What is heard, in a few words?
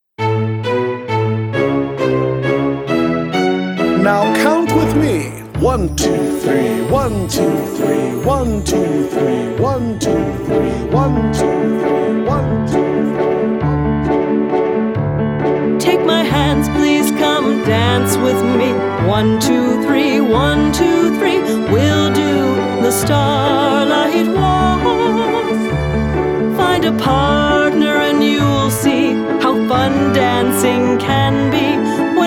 Waltz